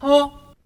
ohh, Voice; Notes

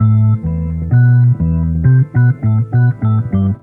keys, Loops, Sad

